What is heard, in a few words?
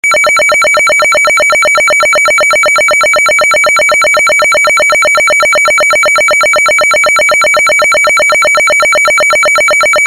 Siren Tone HETRO; UNITROL; Pathfinder